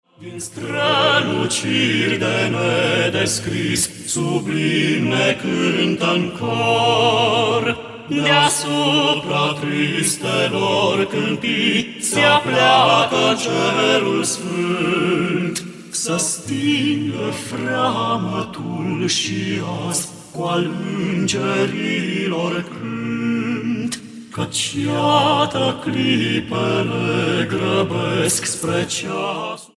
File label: Voice Quartet; Al ingerilor cant; craciun